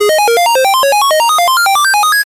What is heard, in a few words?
arcade climb tone